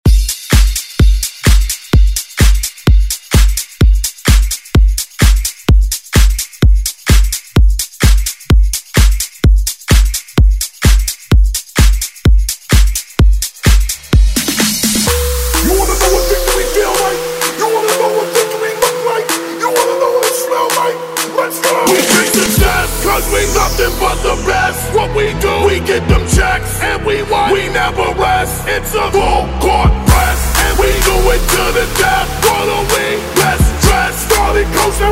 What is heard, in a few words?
Dance; Redrum